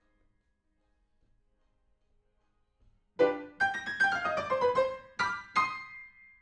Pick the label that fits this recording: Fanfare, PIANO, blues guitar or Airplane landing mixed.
PIANO